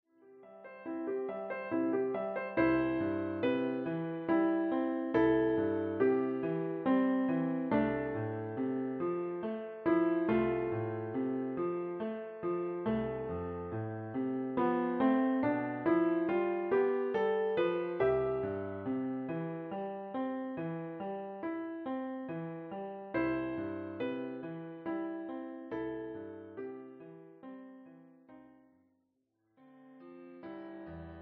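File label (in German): Der Schwan, Klavier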